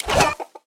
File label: coin